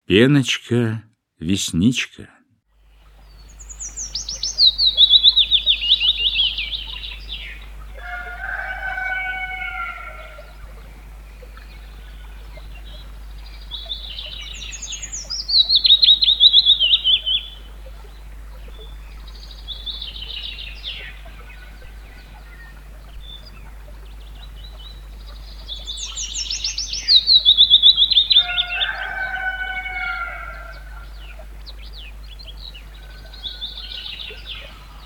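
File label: Willow warbler; Penochka-vesenichka; Golosa ptic i zverei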